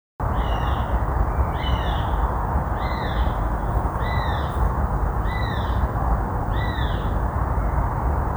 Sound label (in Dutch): Sperwer Accipiter nisus; dieren; accipitridae; Atlas van de Vlaamse broedvogels